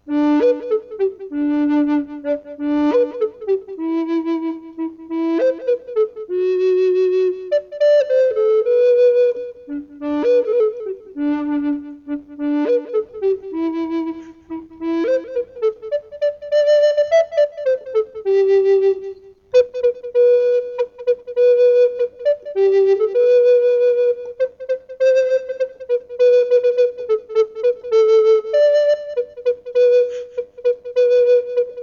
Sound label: Jingle Bells; MelBay